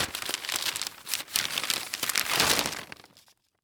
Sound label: Household Newspaper Open